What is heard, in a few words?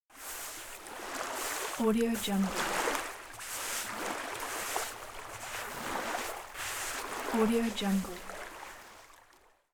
footsteps on the sand